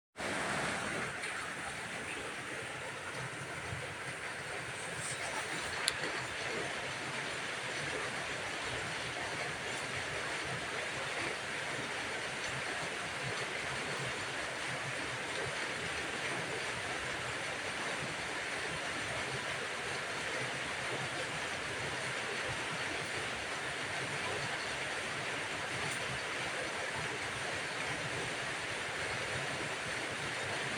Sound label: relaxing rain sounds